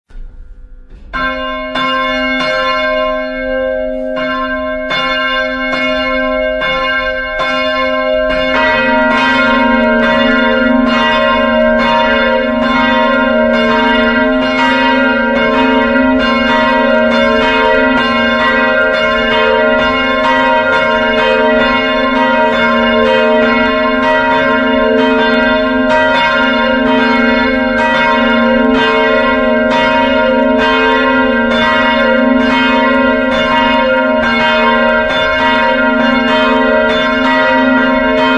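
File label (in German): Tonaufnahme b1 c2, mehrstimmige Geläute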